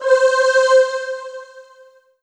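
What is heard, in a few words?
Yamaha TG500 Choir Pure C5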